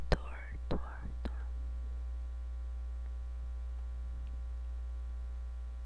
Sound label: door